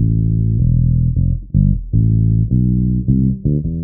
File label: bass; Phonky